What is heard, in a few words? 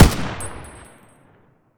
rpk shoot; emx draftsman